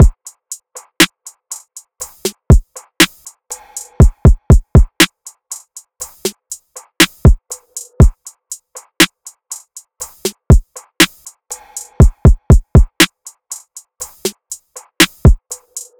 Bright Drum Loop, 120 BPM; Cymatics; Vibes II Drum Loop Collection

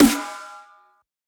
Snare, Dubstep Snares

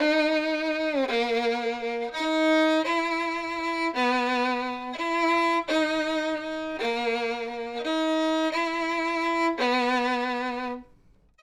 String Loop - 84 BPM D# Min; Cymatics; Light Free Sample Pack; Bonus Samples

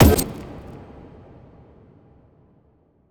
impact electronics; Hits, Impacts

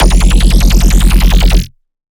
Dirty Bass F 150; One-Shots; Bass Hits (Dirty); Ghosthack - Color Bass Essentials

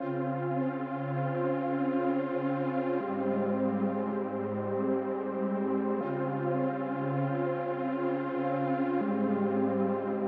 80 BPM F Min Pad, Lofi; Melody Loops; If You're Not Real; Cymatics; ANALOG EVOLUTION Beta; Lofi Collection

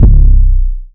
808 - C; Cymatics; PHARAOH Beta Pack; Attitude

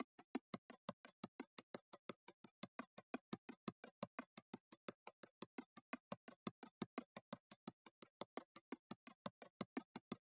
Drum Loop, 86 BPM Percussion; Loop Stems; Cymatics; DOPE DRUMS VOL. 1; Sky

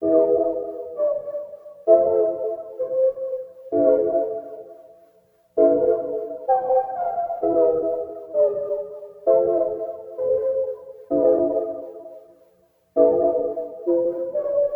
130 BPM A Min Prophet 6 Chords; Melodies; Loop Stems; Cymatics; Osiris

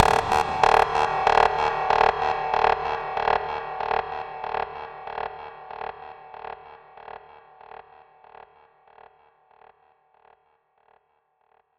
Conga Glitchception 142 BPM

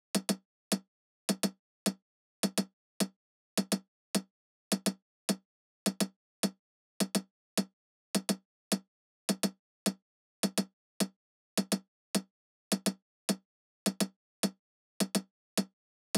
Drum Loop, 105 BPM Percussion, Latin; Loop Stems; Cymatics; GHOST (Beta Pack); Crisp Drum Loop

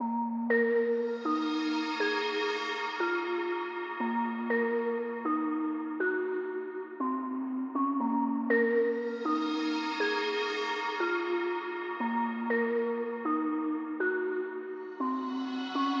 Counter, 120 BPM A# Min; Loop Stems; Cymatics Gems Vol 4; Gems Free Teaser Pack; Robbery

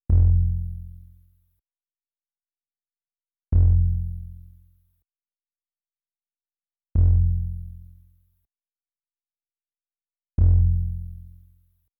140 BPM F Min Bass, melody loop stem; Trap; Loop Stems; Trap Pt 2; Plug Talk

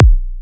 Kick B, Psy Kick; Drums; Ghosthack; Ghosthack Advent Calendar 2017 Day 9 - Psy Kicks; Ghosthack 20 Free Psy Kick

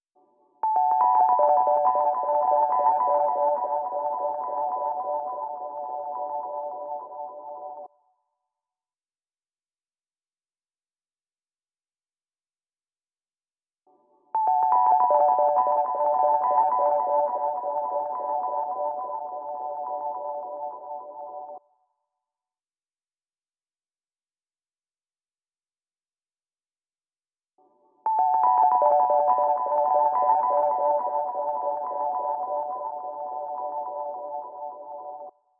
70 BPM B Min Delay Rhodes; Melody Loops; Bluebird; Cymatics; ANALOG EVOLUTION Beta; Lofi Collection

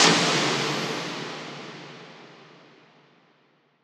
Fx 130, Techno; Techno Sounds 2024

